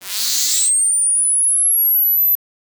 a short energy fuze elect, buzz

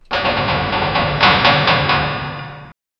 a loud metallic clack, machine

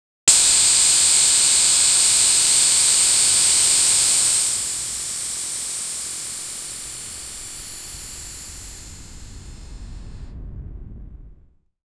smoke bomb ignition a lou; explosives

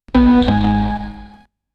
game error wrong; games

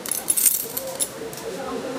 paying a gold, shop

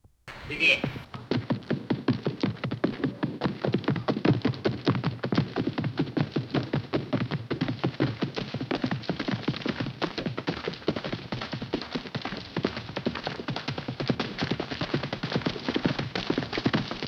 chaotic village chase

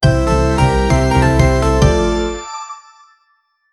mobile game reward

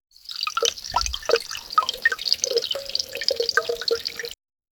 the soft soothing trickle, healing

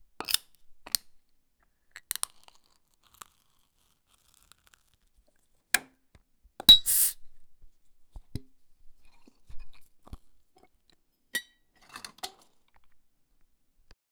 opening an iron can with, bubbly

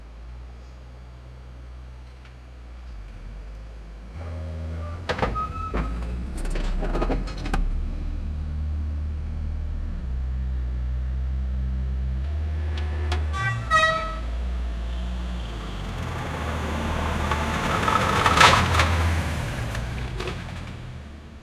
the sound of the opening, dumping

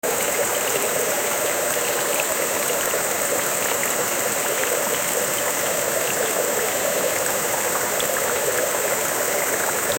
huge amount of water floo, water

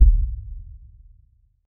use a blend of percussion, synthetic

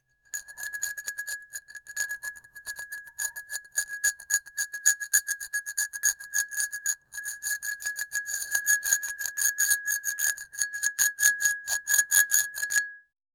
draw circular strokes on, artistic

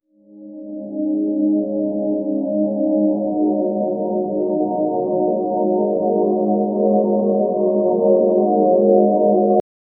relaxed atmosphere angeli

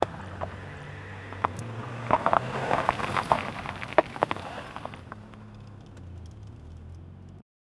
old car driving 70kmh, inside car

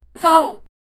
a shocking middle aged pe, shock

